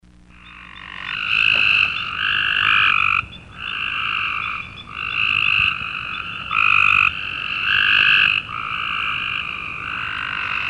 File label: Copes Gray Tree Frog; frog-toad-calls